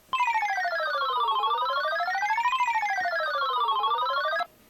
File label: samsung sghe700 ringtone